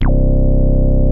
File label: HITEC LOW C1, ANALOG BASS; Music Store - Claudius Diemeter Schwerlos